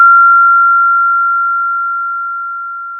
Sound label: Sound of a school bell